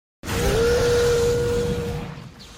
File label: zinogre roar overcharged